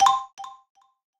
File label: Puzzle Success Xylophone Two Note Climb Bright Delay; stereo; Universal Sound FX